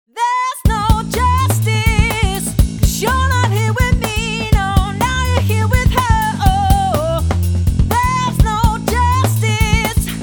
mix normal comp

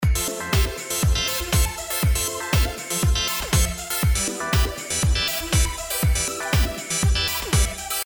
synth filter

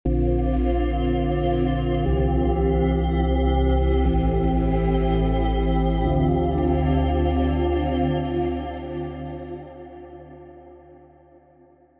Pad

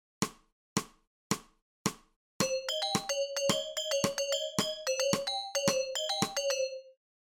scale

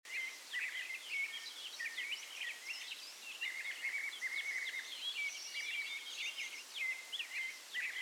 NOISE; Pigments3

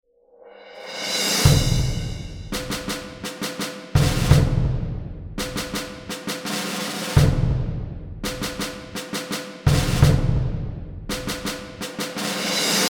rythm

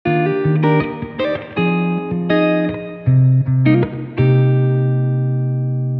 Guitar Spring

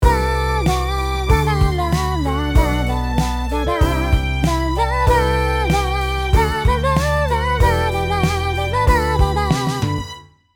Counter Synchro

